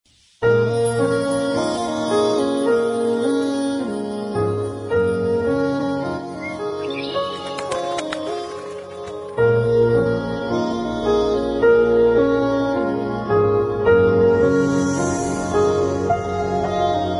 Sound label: song bgm music ringtone entry bgm ringtone; arya; mr perfect; allu arjun